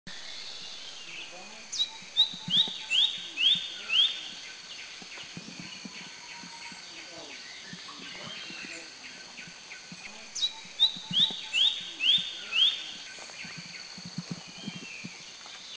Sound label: Lesser Cuckoo Shrike; Thailand; Krung Ching